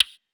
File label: Percussion; Wallet; Cymatics; GHOST (Beta Pack); Drum Kit